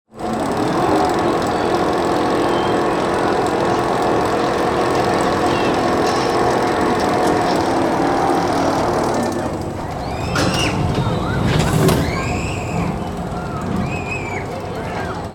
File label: leisure fairground ride rollercoaster climb drop; dragons fury